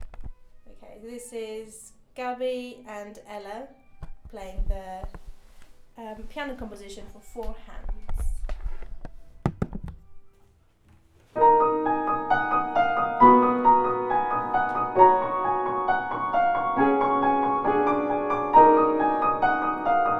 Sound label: piano piece for four hands